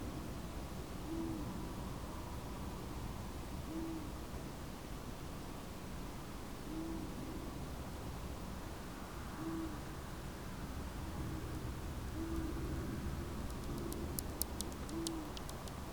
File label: Long eared Owl Asio otus; Arizona